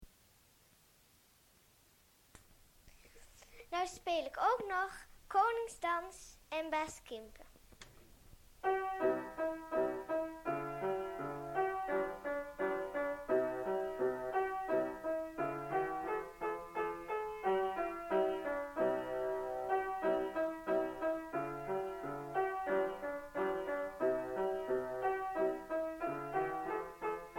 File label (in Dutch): piano; muziek cassettebandje liedjes